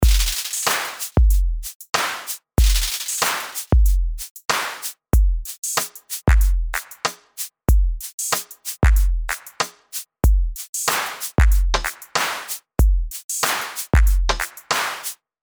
Hip Hop; RP Punch; Punch Hip Hop example 1

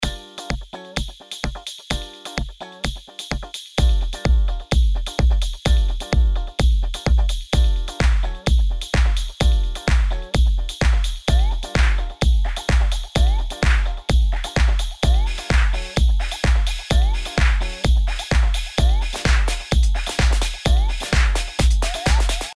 Punch

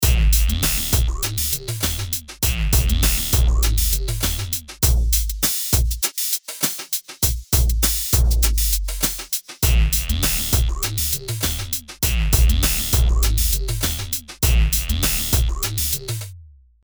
Dance; RP Punch Dance

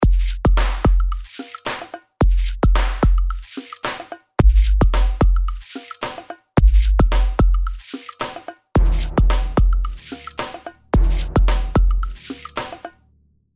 Hip Hop; RP Punch

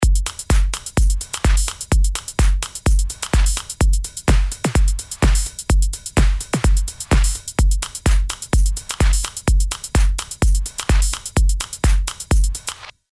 Dance; RP Punch Dance

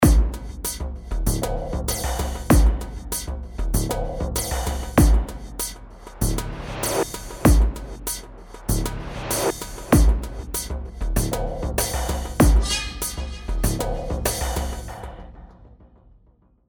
Punch Film Score & TV

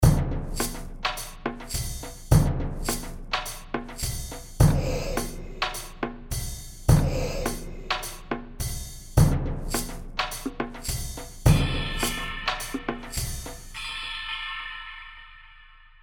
Punch Film Score & TV; Punch Filmscore & TV example 2

